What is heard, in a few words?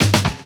tom crunch; Drums; One Shots; Slate; AURORA Sample Pack; Toms